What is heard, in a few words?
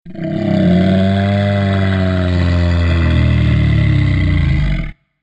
Torosaurus Roar; dinosaurs